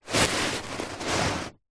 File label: hand movement scrape carpet; zapsplat; foley